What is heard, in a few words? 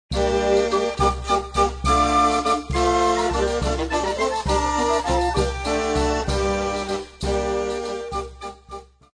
Basse Dance